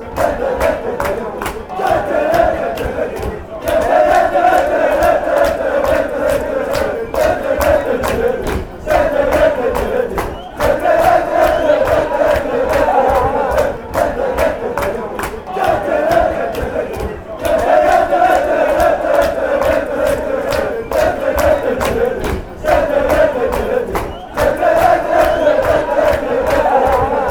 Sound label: dududu, fan chants